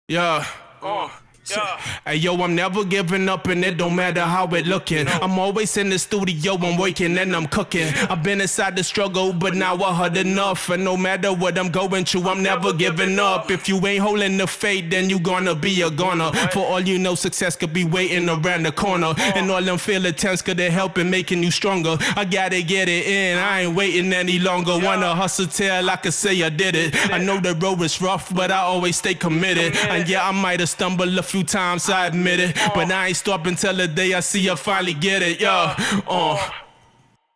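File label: hip hop 170 F sharp major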